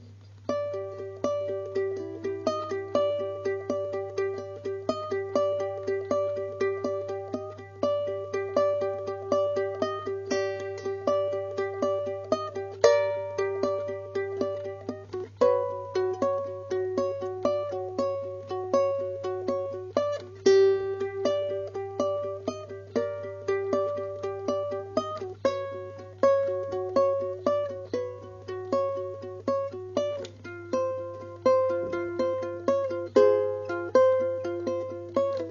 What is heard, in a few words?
Uke; Halloween